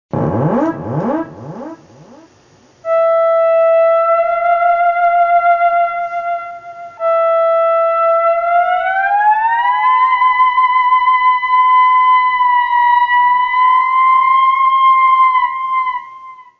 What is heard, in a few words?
theremin